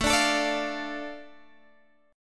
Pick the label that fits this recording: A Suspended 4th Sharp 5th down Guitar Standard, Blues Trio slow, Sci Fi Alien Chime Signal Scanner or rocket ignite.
A Suspended 4th Sharp 5th down Guitar Standard